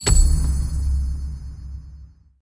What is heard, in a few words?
pot Lamp; mercy of the gods